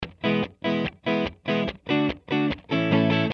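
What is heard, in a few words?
Ska Guitar; Loopology; Ska Till Ya Drah; Lounge & Reggae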